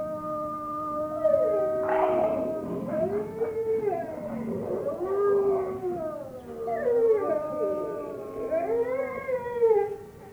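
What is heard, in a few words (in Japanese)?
ookami, タイリクオオカミ 鳴き声; 遠吠えは群れ全員が参加し、10キロメートルも先まで届きます。; 上野動物園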